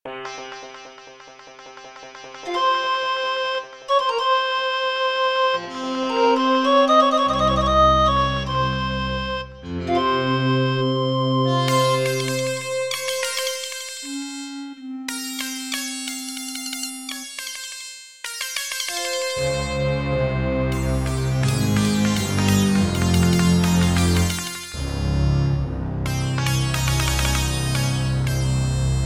Yamaha MU500 GSMODE; Prince of Persia 2 - Intro

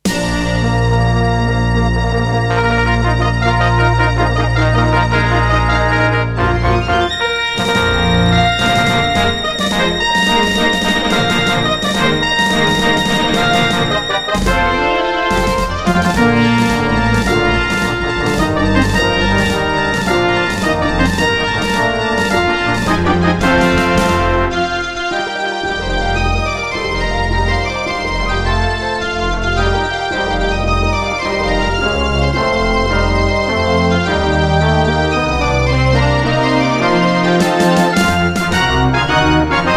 Intro, Tascam Pocketstudio 5; Dark Forces

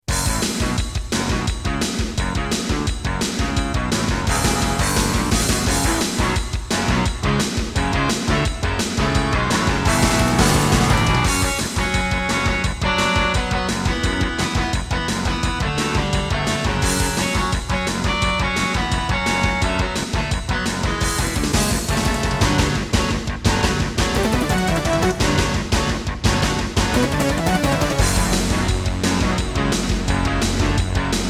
Intro, Roland SC-33; Duke Nukem 3D

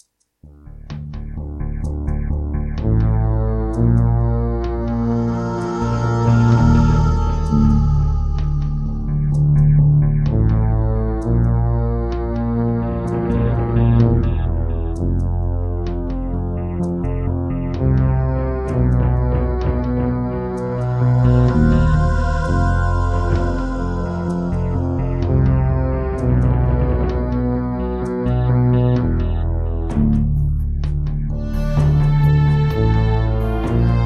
Serdaco Crystal Blaster C1; Descent II

